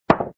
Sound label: hit, 台球; wanNingTaiQiu